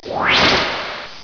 Space launch, industrial

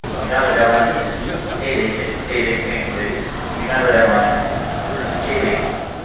People airport; People at Airport

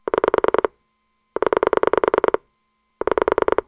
Telephone pulse; office

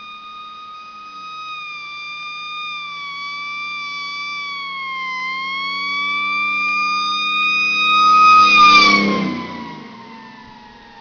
Firetruck, transportation

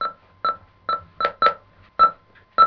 Radiofrequency; Miscellaneous

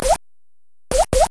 Waterdrip

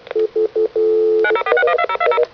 Telephone line, office

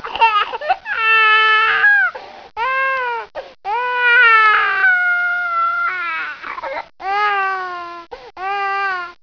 Baby crying

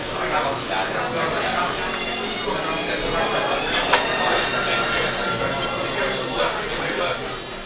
Restaurant, people

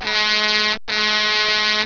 Truck horn

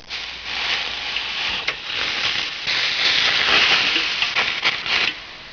fizz

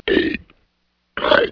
Burp, people

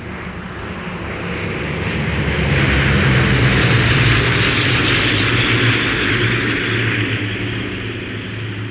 Cessna flying

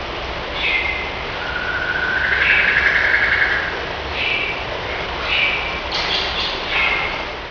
tropical birds, nature

